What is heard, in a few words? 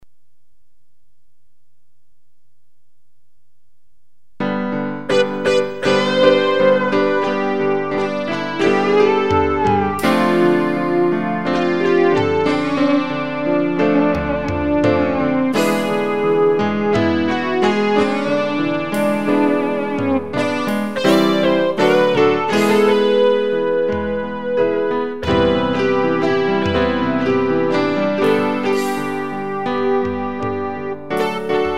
DGX manip w custom drums